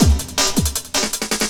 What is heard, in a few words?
LOOP, 160 BPM, Drum & Bass